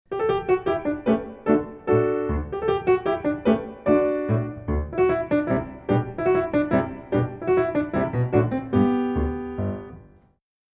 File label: Minuet; Grieg; Grandmothers Minuet